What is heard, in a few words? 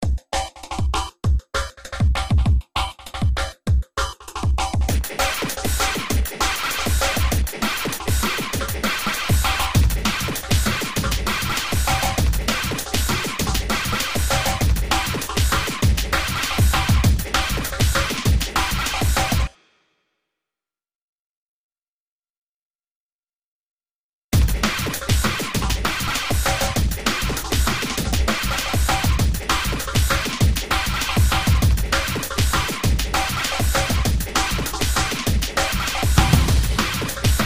Drums & Sfx; sword Pig; NeverDead; PS3